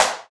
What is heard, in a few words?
original snare sample g key